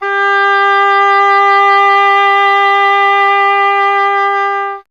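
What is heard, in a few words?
oboe, wind; Miroslav Vitous - Solo Instruments VOL-2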